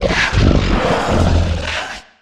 Humanoid Grunt Breath, Monster Sounds; The Decline Of Warriors; Monster Sounds Volume II

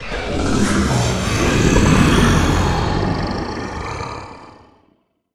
Digital Creature Transformation, Monster Sounds; The Decline Of Warriors; Monster Sounds Volume II

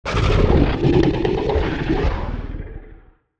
Feline Breath, Monster Sounds; TheDeclineOfWarriors; Monster Sounds Volume II

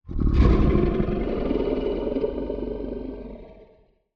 Feline Roar Breath, Monster Sounds; TheDeclineOfWarriors; Monster Sounds Volume II

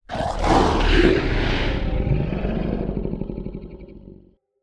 Digital Creature Roar With Tail, Monster Sounds; The Decline Of Warriors; Monster Sounds Volume II

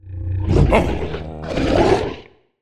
Canine Long Roar, Monster Sounds; The Decline Of Warriors; Monster Sounds Volume II

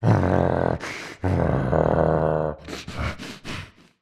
Canine Growling Close Mouth, Monster Sounds; The Decline Of Warriors; Monster Sounds Volume II

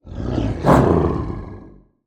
Canine Regular Roar; The Decline Of Warriors; Monster Sounds Volume II

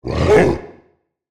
Canine Loud, Monster Sounds; TheDeclineOfWarriors; Monster Sounds Volume II

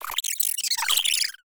Tiny Creature High Pitch, monster sounds; TheDeclineOfWarriors; Monster Sounds Volume II

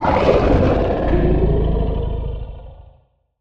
Low Roar Short, Monster Sounds; The Decline Of Warriors; Monster Sounds Volume II

